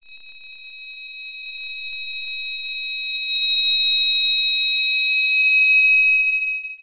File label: Science Fiction Sci-Fi Electronic High Pitched Ring Swell